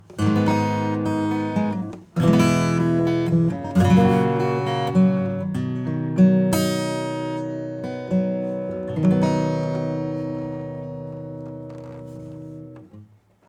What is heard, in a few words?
acoustic; Spectre